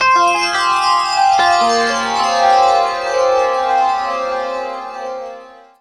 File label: Riffs; Jungle; Riffs, Arps & Hits; Blu Mar Ten - Jungle Jungle - 1989 to 1999 Samplepack